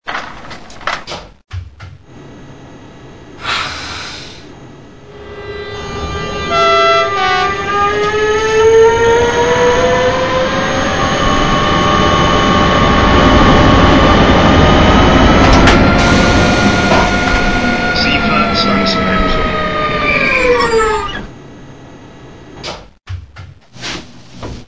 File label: Elok DB BR185 SBB Re482 BLS Re485; H0